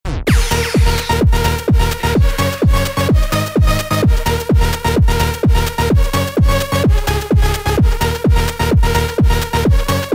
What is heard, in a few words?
Dub